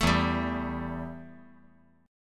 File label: E Minor Double Flat 5th E